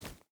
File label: footstep barefoot forest run; Rust